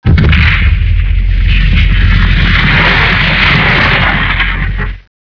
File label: quake; ambience; gquake4